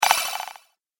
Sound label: Robotic Droid Noise; Robot Sound Effects